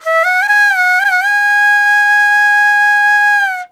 VENU, Old World Instruments, World Winds; E-MU Producer Series Vol. 7 – Old World Instruments; World Combos

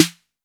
TR-808 Snare, Roland BK-5; Snares

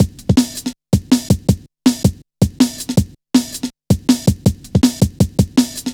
drum loop, 161.5; Zero-G Jungle Warfare 1, 2 & 3

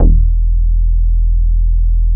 NEW YORK BASS, GARAGE, Bass Analog & Synth; USB Soundscan vol.12; AKAI

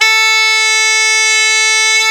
CHANTER A3, Bagpipes; E-MU Formula 4000 Series Vol. 4; Earth Tones

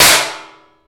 pan, Kitchen; Roland L-CD701; Trash+Kitch

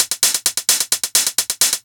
drum 130 hihat, Techno Trance, Drumloops

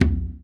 surdo; ILIO; Ethno Techno

